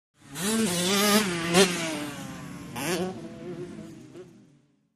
Motorcycle Motocross; By 250 Cc Honda, Cu Fast By L / R, Shot Cu.